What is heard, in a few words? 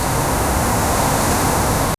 wind through trees